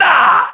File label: death, voiceovers; english